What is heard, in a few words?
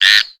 Exterior Random Critter